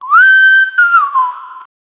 whispering chorus faint; voices

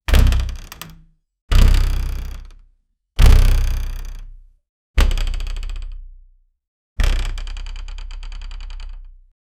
door violently shaking, wooden impact

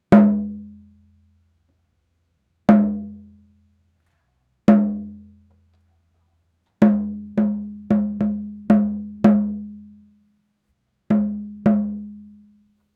dabakan instrument sounds, world music

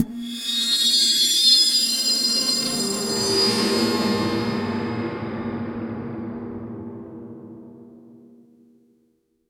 combo 1 light slash fast, action

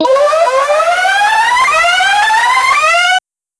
race start

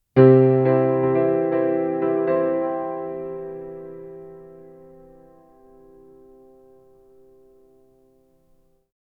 a modern piano playing

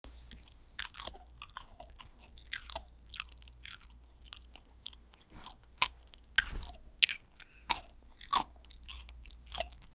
the sound of a child, grimace

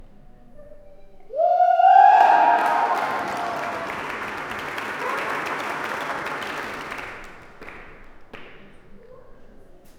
indoor swimming pool audience

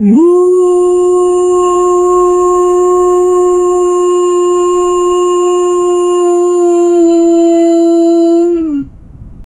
gentle uh oh tone

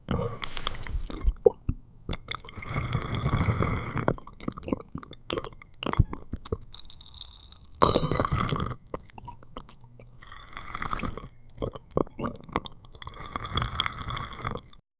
soft realistic slurping n; dining

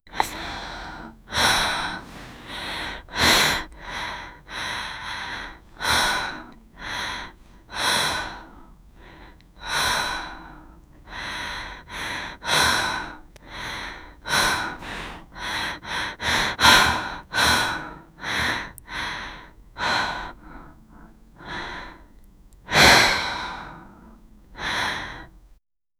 girl breathing inside gas

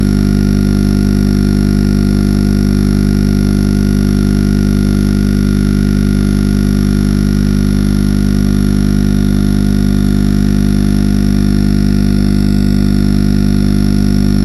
looping sound of an electricity

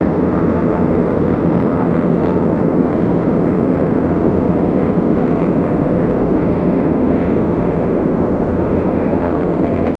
cockpit sounds in a passe, airplane

